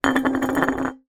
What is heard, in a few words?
household glass jar large down wobble; zapsplat